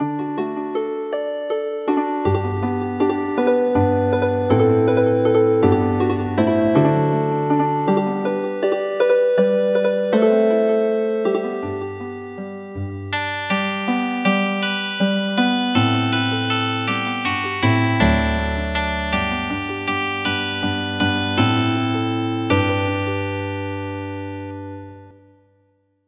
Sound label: Healin Harp; midi; traditional